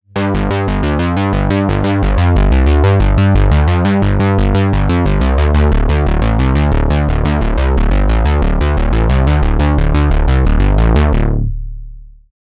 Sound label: Taurus Bass; PRO12; opxpro2